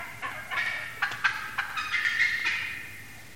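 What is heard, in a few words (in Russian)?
индейки массовый активный далекий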